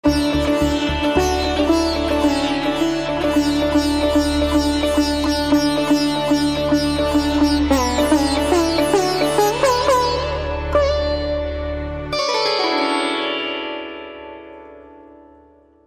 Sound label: Fusion